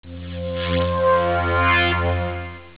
robot exit

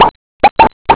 pops

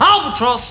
albatros

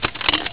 clicky

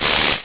powertool

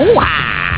"hoo-ahh"

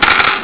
coin drop

